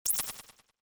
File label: Notif